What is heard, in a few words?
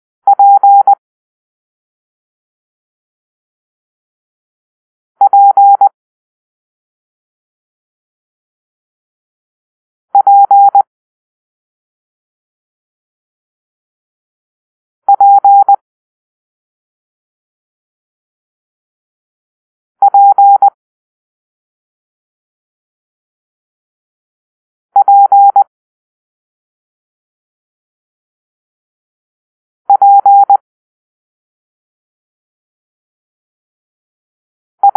Papa (P), morse code